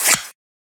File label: perc slurp; one shots; SEISMIC Drum Sample Pack; Slate